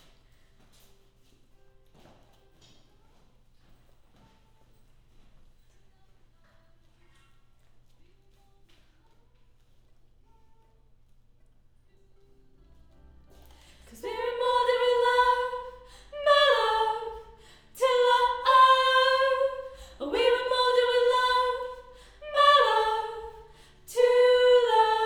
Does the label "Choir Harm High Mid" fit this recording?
Yes